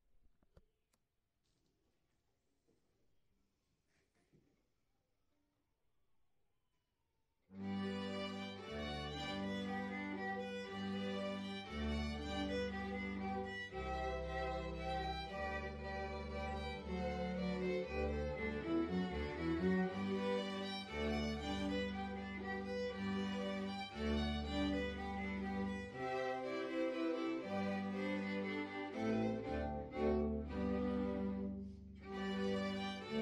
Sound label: Minuet II